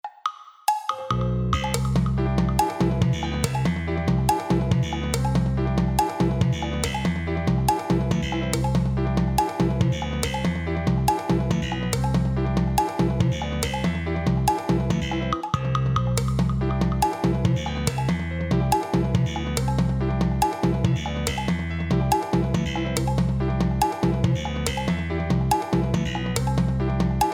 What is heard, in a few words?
samba